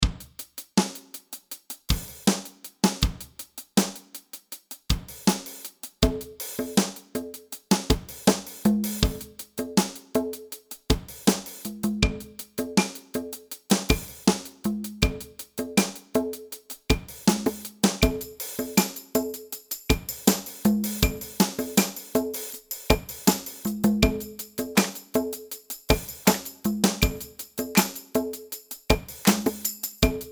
80 bpm vierkwartsmaat

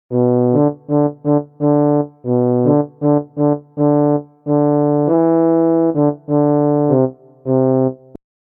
Fanfare Tuba; Toontje Hoger

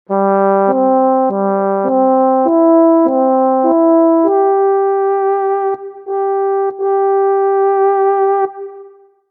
Fanfare Hoorn; Toontje Hoger; Er is er een jarig

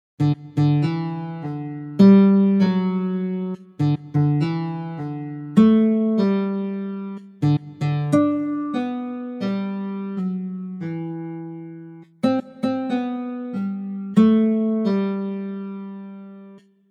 Gitaar; Toontje Hoger

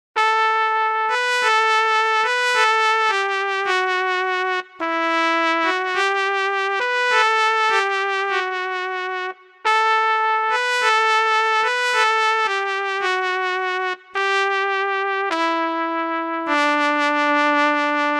Trompet; Toontje Hoger

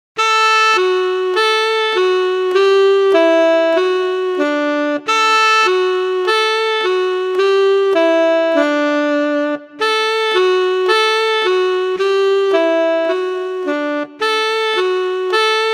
Saxofoon; Toontje Hoger

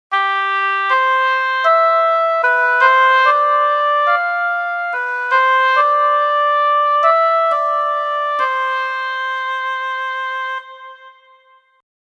Fanfare Hobo, Ik heb mijn wagen volgeladen; Toontje Hoger

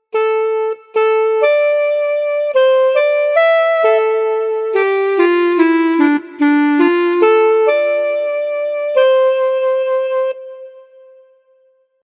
Fanfare Klarinet; Toontje Hoger

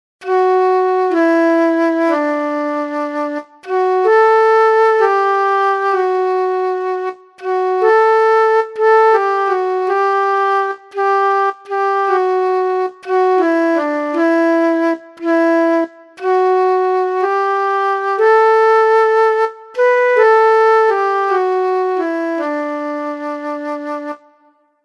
Fluit; Toontje Hoger; Slaap kindje slaap

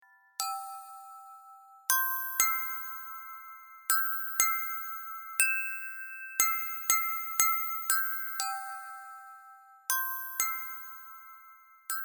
Fanfare Xylofoon; Toontje Hoger

